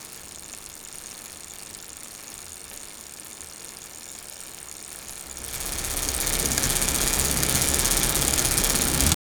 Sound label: create sound of fast ridi, mountain